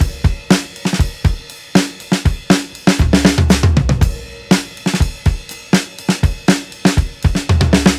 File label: RIDE FILL, breaks, drums; Basement drums vol 2